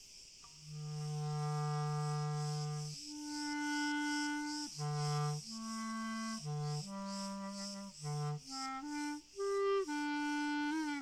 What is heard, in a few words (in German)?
Grillen Chor Cl Stand Mix Fern